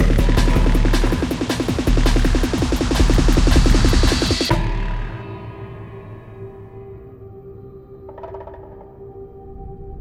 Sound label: Music Boss Ending; Sifu; Boss Hideout4; Fengjie Death Wude SYNC